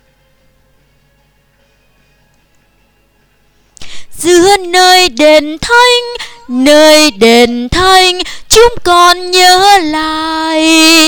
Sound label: alto bass